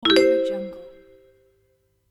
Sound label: great idea